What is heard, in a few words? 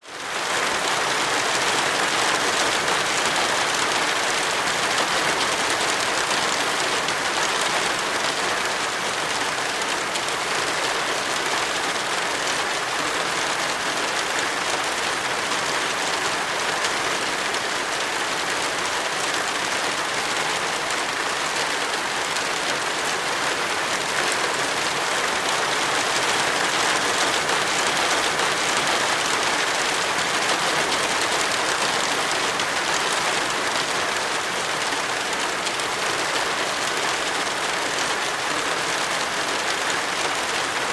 nature rain falling on verandah roof; zapsplat